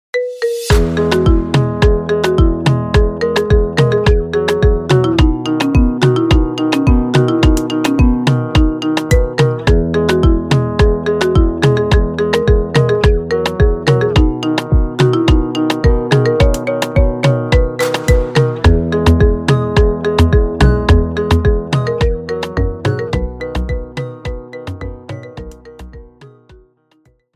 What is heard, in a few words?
Ringtone